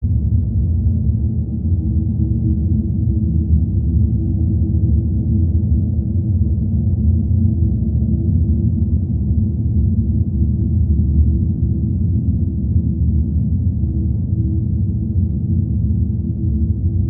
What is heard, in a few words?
Ambiance Mid Bass Te; drones & tones